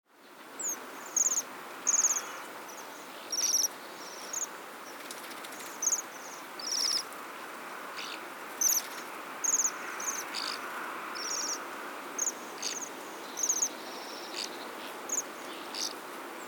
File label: Waxwing